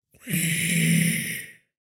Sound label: Skeleton Archer Attack Vocals